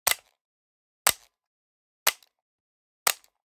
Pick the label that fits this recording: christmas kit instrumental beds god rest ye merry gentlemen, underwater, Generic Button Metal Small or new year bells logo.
Generic Button Metal Small